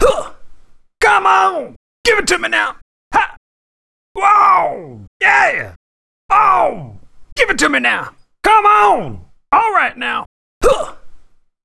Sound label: funk stings